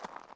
mud walk, step

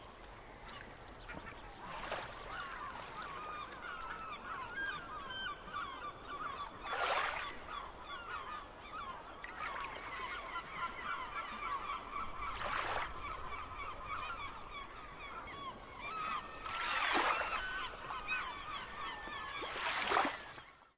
seagulls; ambient

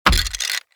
hurt, skeleton

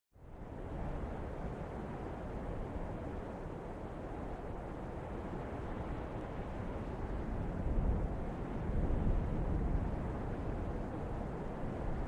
sand wind

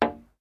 glass hit

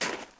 snow walk, step; blocks; Minecraft; Remastered

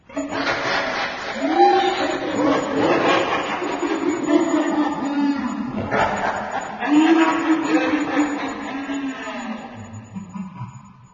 soulsand, ambient

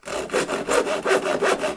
craft saw; ambient

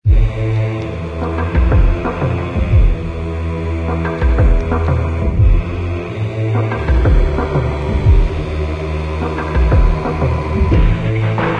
bell fight soundtrack; void; Minecraft

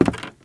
squeaky wood walk, step, bamboo; blocks

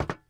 metal box walk; blocks; step

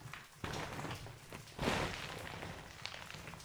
small slide, ambient underground

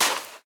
sand walk, step; Minecraft; Remastered

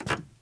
squeaky wood wander, step, bamboo; blocks

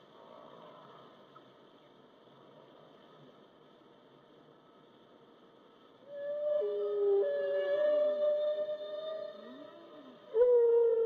whale; ambient

